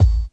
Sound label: low tone bass drum single hit G sharp key; Duration 0:01; Samplerate 44100 Hz; Channels Mono